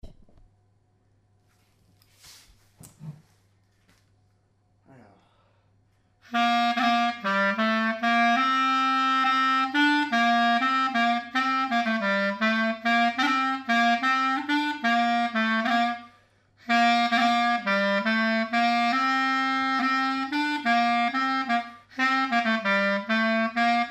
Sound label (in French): rond paludier liqueur lent, clarinette; stg clarinette